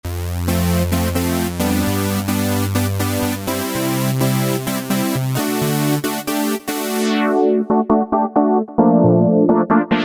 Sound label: Korg microKORG Stab Saw; Factory Voices